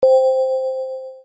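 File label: sound call alert, Football; Nokia 6270 WAP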